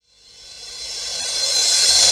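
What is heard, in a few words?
D2 crash, crash reverse; Best Service Dance Mega Drums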